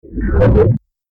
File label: time start; FXHome